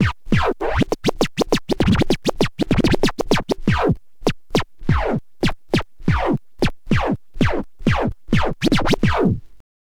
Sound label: scratch med, Vinyl Attack scratches mix